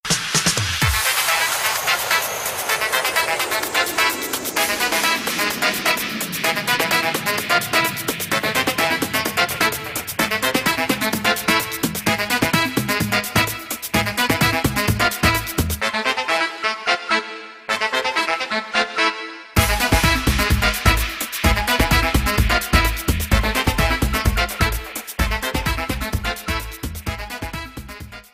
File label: latin house ringtone